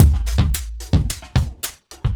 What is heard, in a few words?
Breakbeats loop, 70_s Breakbeats